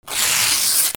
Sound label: PAPER